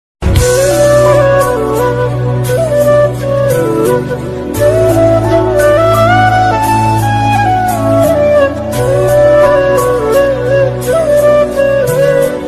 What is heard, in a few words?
instrumental ringtone